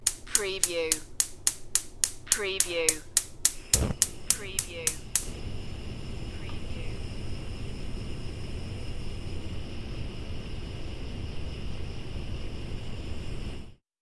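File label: Light Burner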